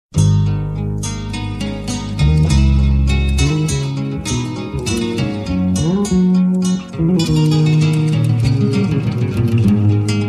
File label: latin guitar